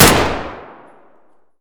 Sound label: vpo209 fire indoor close; Escape from Tarkov